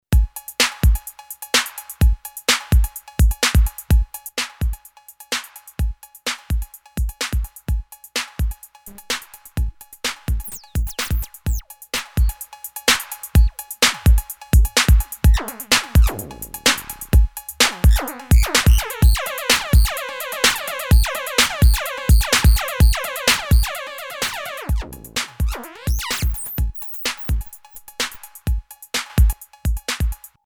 notch env follower, filter; electrix filter factory

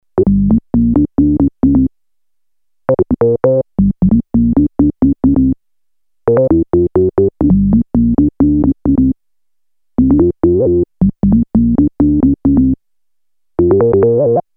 synth farfisa, Moog Minitaur; Synth: a farfisa organ!; analog monophonic synthesizer; 2 VCO oscillator

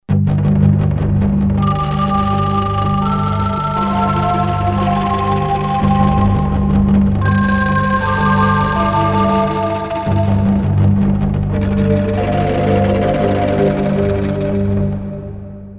korg m1r synth

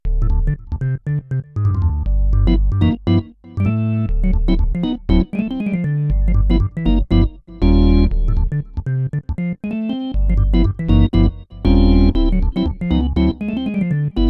organ hammond, synth access virus

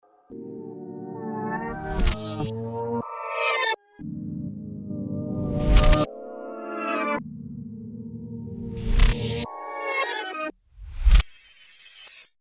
fx rewind, Roland JV880

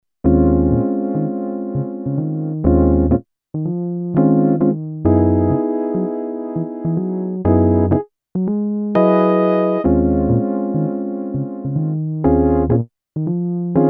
rhodes, Kawai sx240

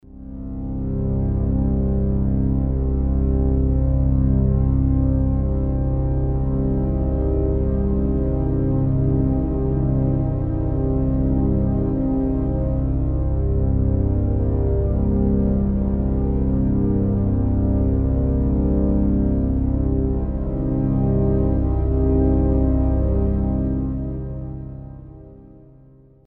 pad dark; Roland JV880

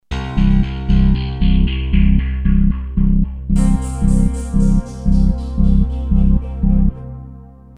synth, Synth: cutoff sequence, Roland JV2080

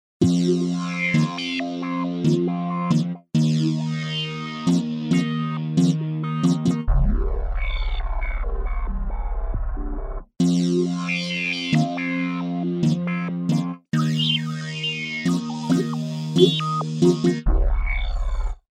synth filter; emu mo phatt

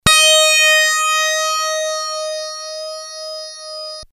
dx7; yamaha; synth

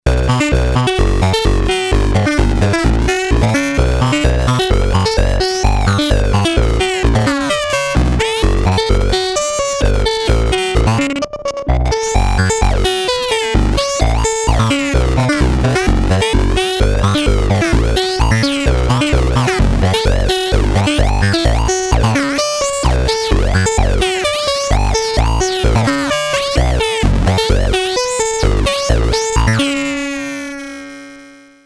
synth ring, korg ms20

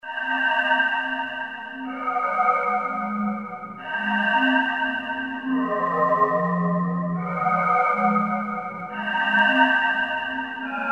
synth ambient, roland jv2080

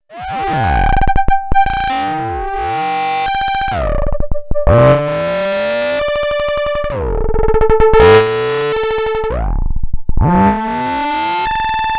torsion 2, Novation Bass Station II; analog monophonic machine with subtractive synthesis

